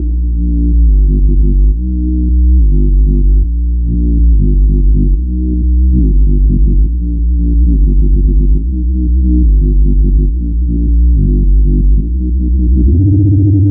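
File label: Bpm140 Bass Loop G major Gm; EarlyMorning; Prime Loops; Dubstep Illusions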